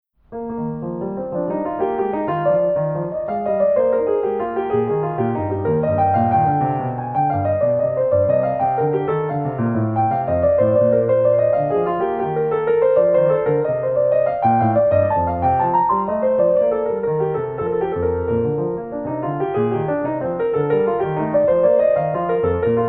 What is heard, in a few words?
French Suite E-Flat Major Courante; J.S.Bach; BWV 815